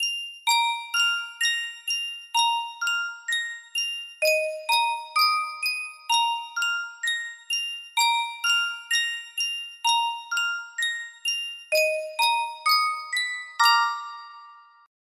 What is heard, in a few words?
sankyo custom tune music box; fiddler on the roof